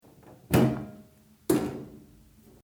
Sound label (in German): Kleiner Schrank